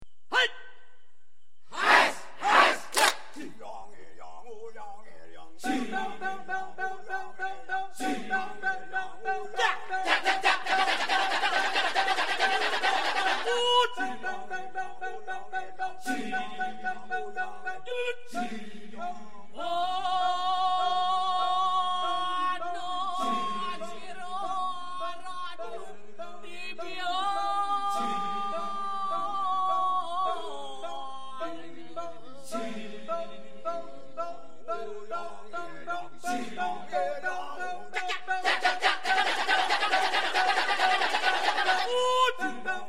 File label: Kecak; Indonesia, Bali; kecak dance in Ubud; Kecak-sounds